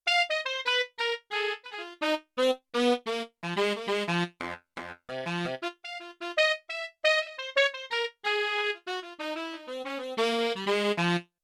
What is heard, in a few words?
Power Breath, Four Saxes, Yamaha TX16W